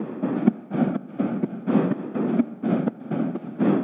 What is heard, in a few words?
125 Atmosphere, Percussion (Mono); Touch Loops; ElementsOne; AbstractRhythms